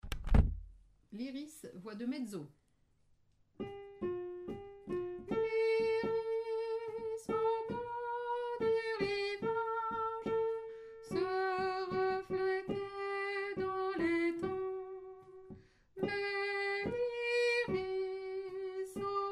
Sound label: liris mezzo